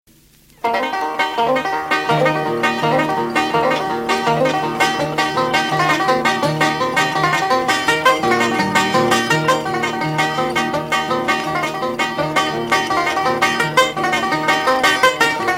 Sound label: talking banjo